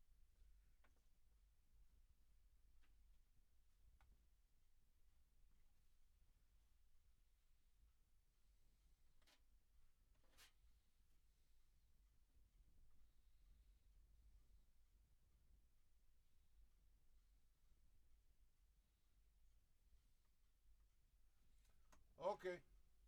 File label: Drums